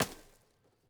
lumber tree

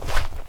fs gravel, singlesteps

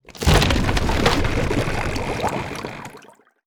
warship death; ships siege

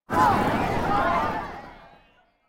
alarm defeat; interface

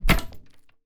pike attack

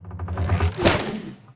ballista attack

